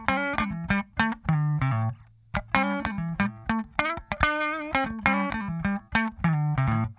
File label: TRICKY, Funk Guitar; 90 s Sample CDs; Zero G